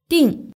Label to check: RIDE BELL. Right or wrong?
Wrong